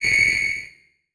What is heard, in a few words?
Multimedia Internet CD-Rom Flash Hi-Tech